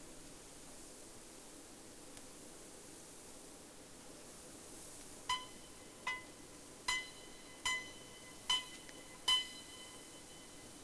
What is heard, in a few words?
sound, Boat; SeaSnake